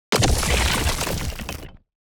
building destruction build

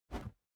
mobs jump

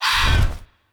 Unstable Bat Get Hit; TANK; 3Souls

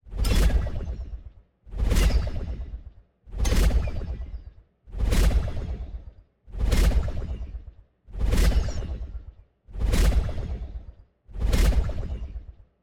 Invisible Platform Loop; TANK; 3Souls

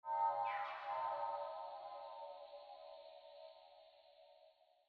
ambience room necromastery magic layer

